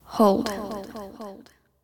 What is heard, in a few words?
Activated Psychic Blast